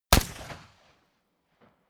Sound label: rifle ak-47 exterior fire single clean bright; comedy cartoon; pistolen gewehre; Pistols and guns